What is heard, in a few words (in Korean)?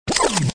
아이템; deepsky